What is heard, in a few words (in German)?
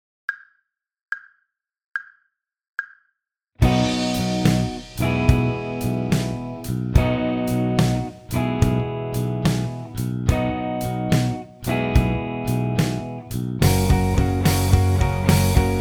Blues Shuffle B Dur, bass